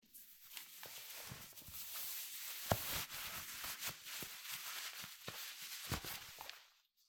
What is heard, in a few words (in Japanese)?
靴下を履く音